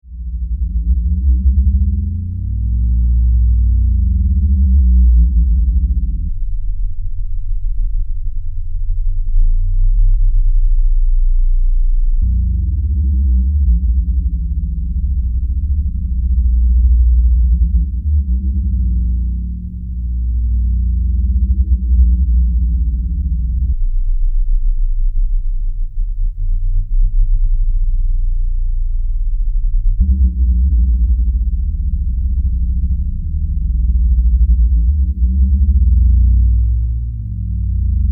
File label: Drone; LAMM RawArtistic PhilKullmann; DRONES EARTH